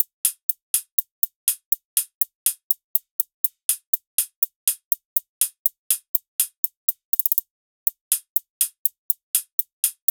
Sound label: loop hat 122; urania